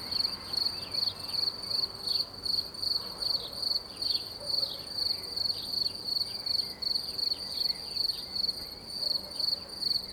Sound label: Crickets